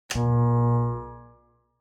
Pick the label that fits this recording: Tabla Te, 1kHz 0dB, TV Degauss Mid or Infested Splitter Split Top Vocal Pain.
TV Degauss Mid